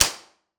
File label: Shooting BB Rifle; Sonic Bat